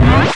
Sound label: LAUNCH; duke nukem total meltdown